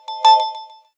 Chime; Cubot; X6

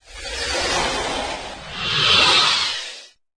Power Down; LG GS390 Prime

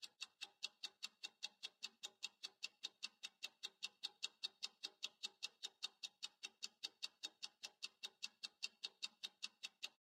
Tick Tick Tick, ringtones; Samsung; Galaxy A SHW-M100S

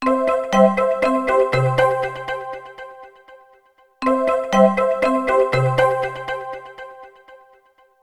Happy Ring

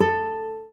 Classic Guitar

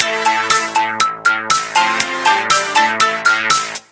Beat Plucker, ringtones; Samsung Galaxy S CDMA SCH-i909

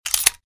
Lock; TCL M2L

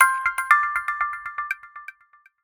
sms; WAO

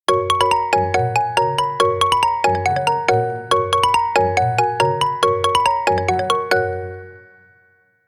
Bright, ringtones; China Telecom

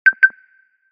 Tweet Beat; Yezz Max-1

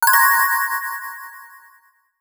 Sparkle Alert; Sony Ericsson; PlusFourSix; Rika (W705); Lars Edvall

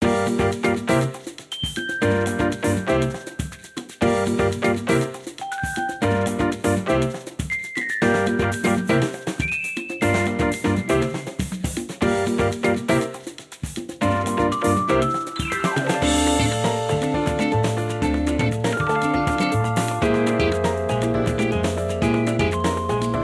Latin Funk, Ringtones; Samsung Z2

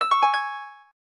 harp, keytone; SKY IM-T100K VEGA No.5

